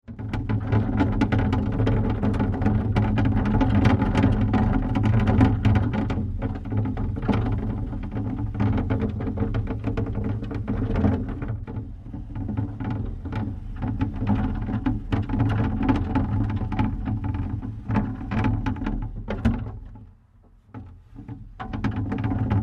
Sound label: Wheelie Bin, Bin wheeled on hard ground - recorded from inside bin; Rolling; Objects; Tom Hutchings